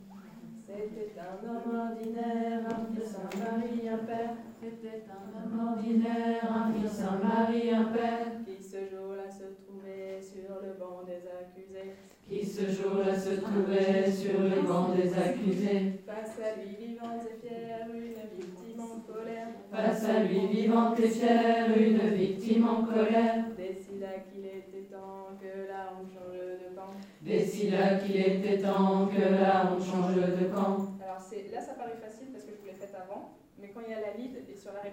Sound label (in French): basse couplet, chante